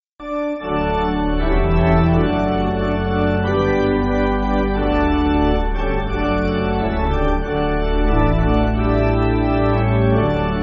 Pipe Organ, Music Backing

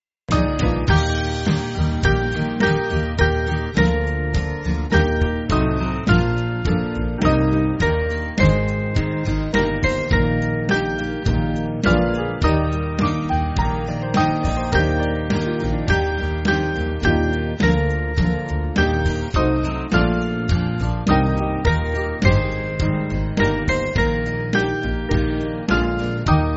Band, Backing Files for Churches; The Light Of The Morning; Crugybar

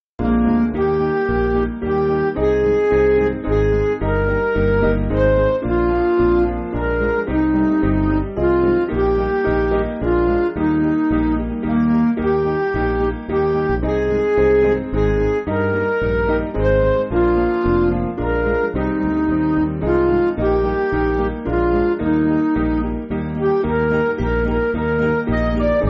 Music Backing Files for Churches

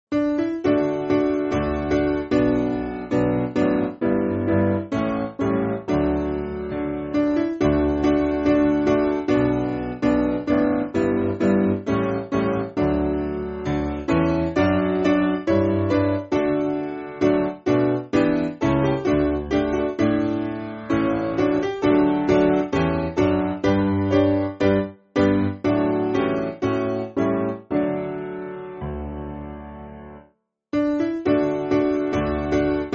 Piano; I Saw The Cross

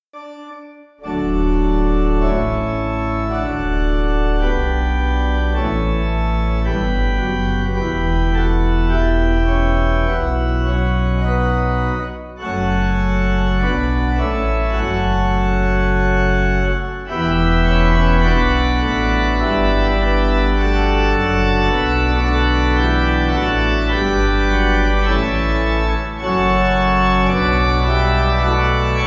Sanctus Pipe; Willan

